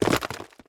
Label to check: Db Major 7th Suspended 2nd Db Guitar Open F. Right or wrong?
Wrong